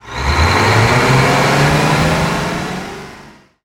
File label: Bradley Engine Acc Start; DCS